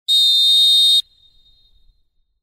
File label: molten outdoor